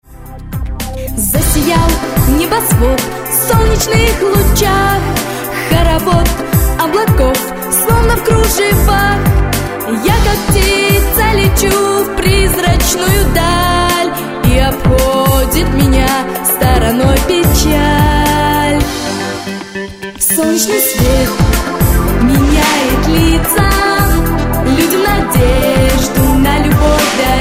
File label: Saxofon